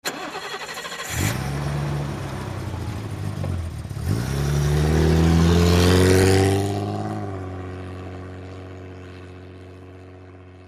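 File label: Bmw 530i, Start close up, Away Medium Speed.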